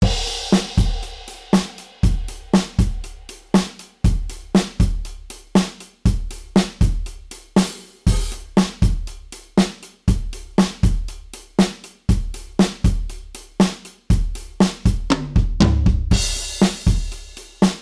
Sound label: modal surf, drums